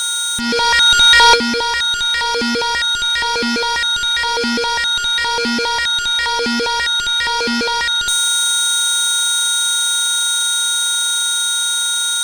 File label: NOISE FX